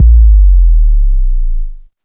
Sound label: KICK XL, Big Beat; Big Beat Samples & More; AKAI